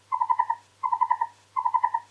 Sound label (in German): Abwehrruf B. orientalis